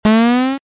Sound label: Trampoline Arcade